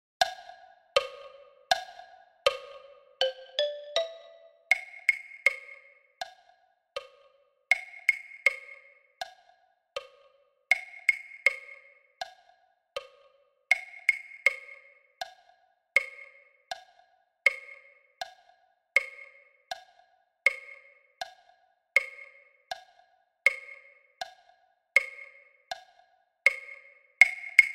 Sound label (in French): claves solo a 80 bpm